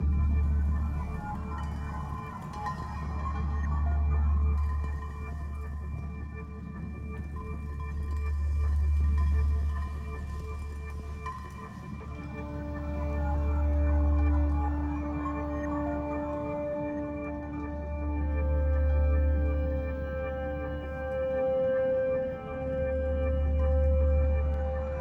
engine room ambient; halfway

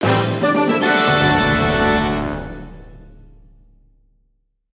Interdimensional Fanfare; Kirby's Return to Dream Land Deluxe

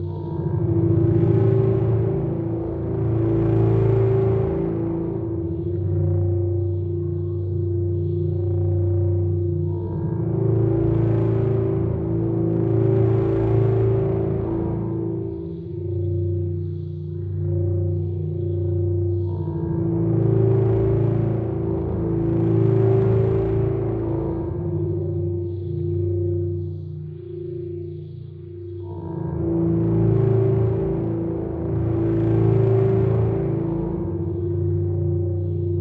Distorted Ambience SIMPLE LOOP; unrest indigo

